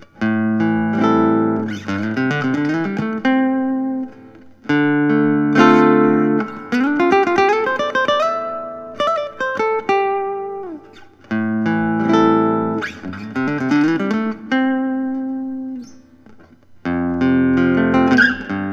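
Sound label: godin acs slim sa natural, guitars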